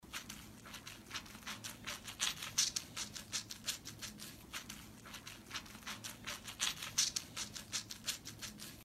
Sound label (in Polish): dzwięk loczków